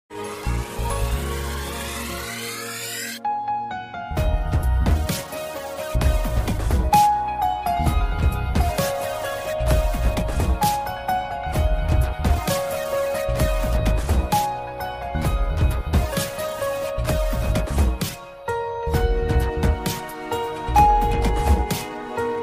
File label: Romantic Bgm Ringtone